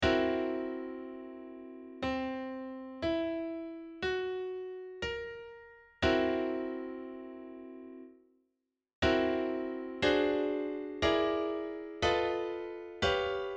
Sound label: dominant seventh flat five